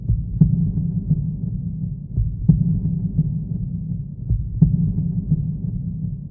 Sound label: heartbeat